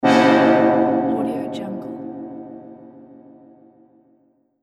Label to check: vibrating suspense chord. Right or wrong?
Right